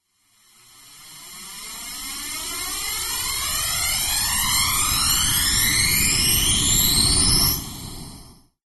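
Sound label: engine start of small rocket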